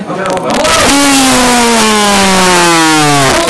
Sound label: boooooooooooo